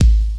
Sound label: prominent bass drum sample g sharp key